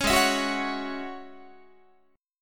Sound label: Ab 7th Suspended 4th Sharp 5th Ab down Guitar; strummed